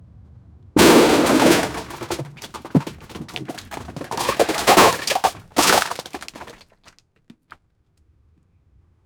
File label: 4 bodies being thrown; body throw; SFX Engine